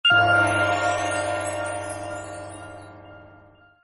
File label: intro sound